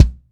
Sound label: BD, Bassdrums, Roland TD-20